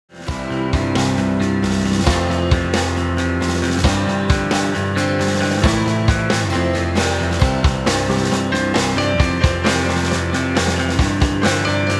Piano

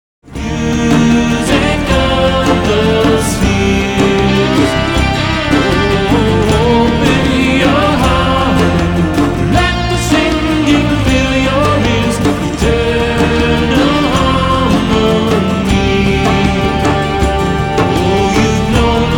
Vocals

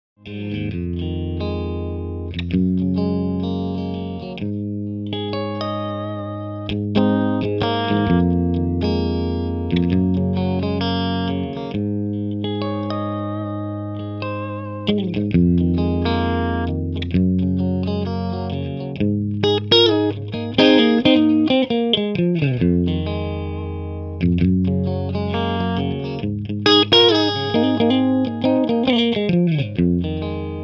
Electric Guitar Isolated

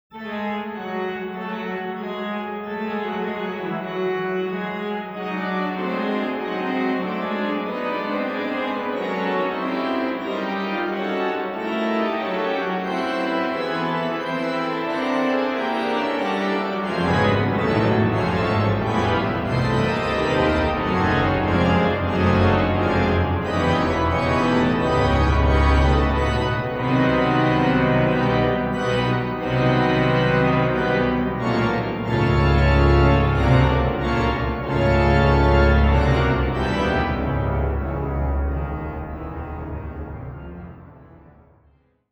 Pipe Organ

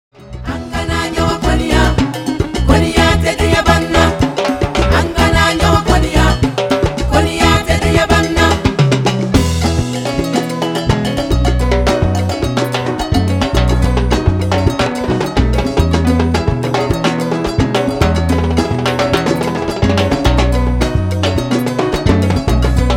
Percussion

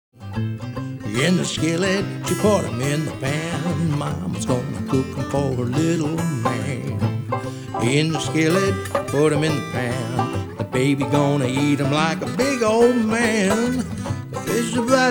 Vocals

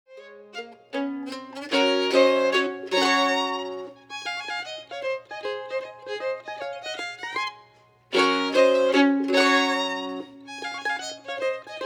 Acoustic Instruments

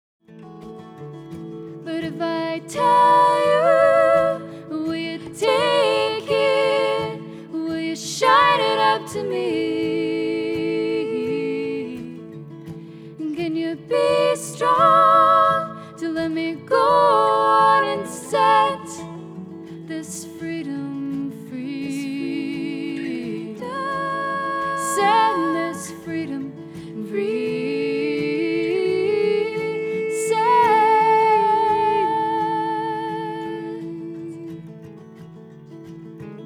Vocals

